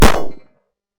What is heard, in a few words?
Gunshot; Hovl Studio; SFX Battle sounds; WhiteMan Unity2D